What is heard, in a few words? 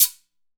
GUIRA, AFRO PERC; EdgeSounds; Drum Mashines VOL-1